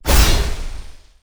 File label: Magic Spells Impact Creation; David Dumais Audio; Spells Magic 1